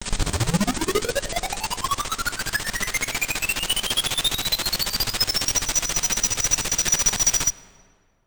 Malfunction Glitch Rise; Bryce Raffle Sound

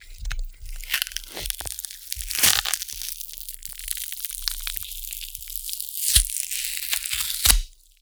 Cardboard Tearing Slow; Double Trouble Audio; Punchy Cardboard

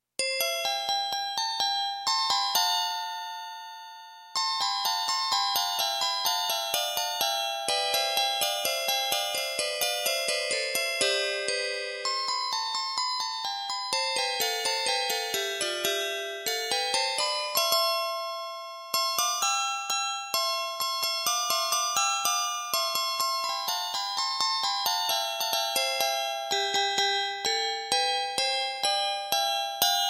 Bells, Unclassified Electronic; Edgar's Bells - Movement 1 Silver

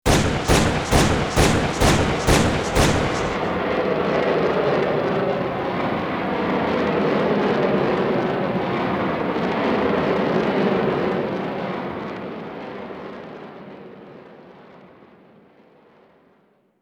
Bullet Time; FXHome; Bullet and Gun

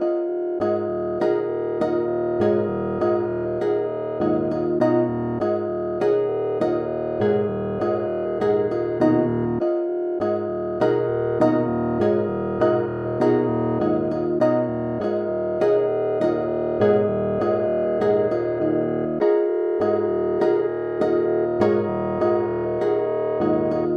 Loop D# 50 bpm; 99Sounds; Mangling Audio; Mangled Software; Midiception

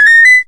jump, Retro Sounds; Kenney's Sound Pack; Retro Sounds 1

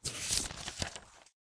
Grabbing Paper; Sounds Hunter; Paper Sound Effects

